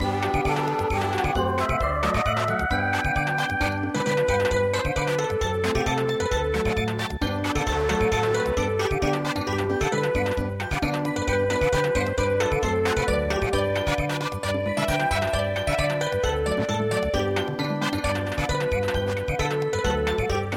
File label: march Samba; midi traditional; battleship